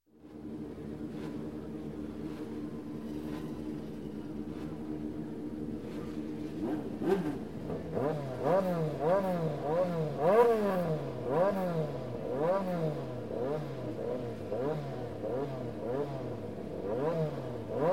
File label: Motorcycle, Race Pit Ambience, Revving & Leaving; Sound Ideas; Series 2000